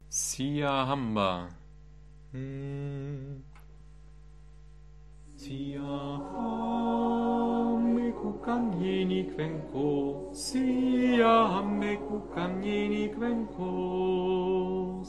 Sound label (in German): Sopran; Siahamba